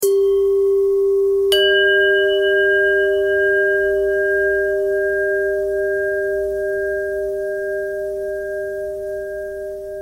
bell bell thread simple, bells

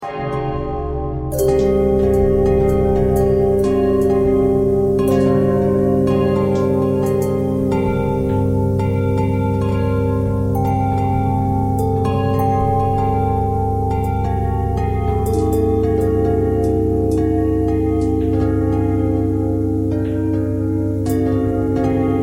bell bell thread pentatonic, bells

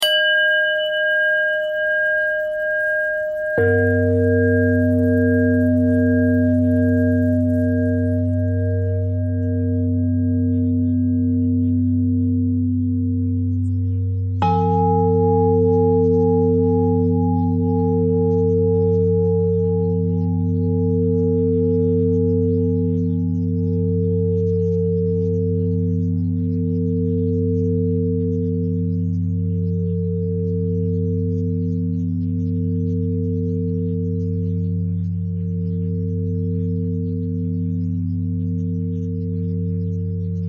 bell bend threads; bells

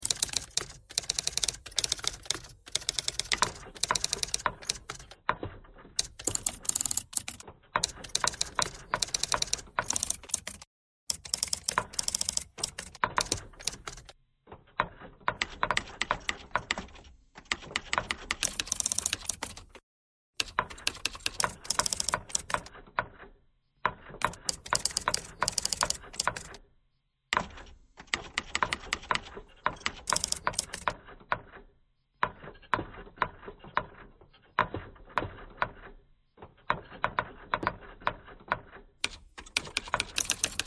typewriter thread pentatonic

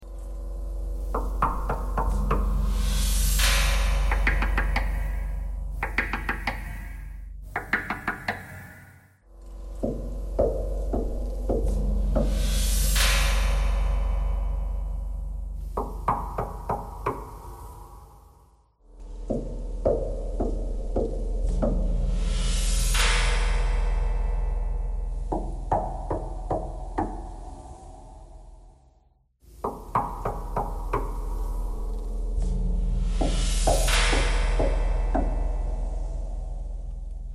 knocking thread noise

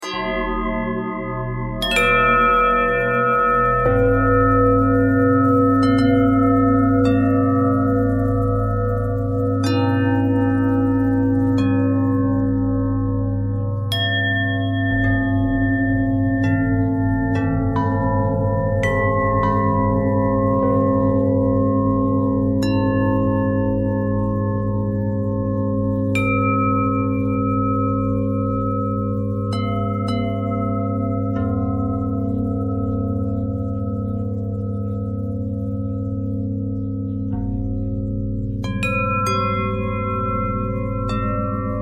bell twist

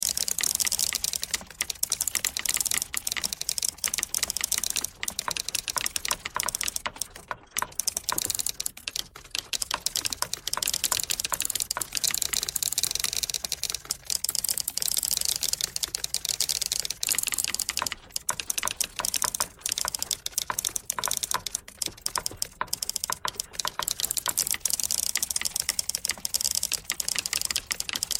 typewriter thread all

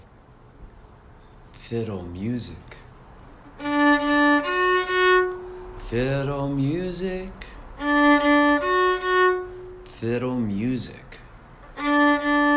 Fiddle Music

